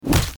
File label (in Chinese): 怪物攻击城墙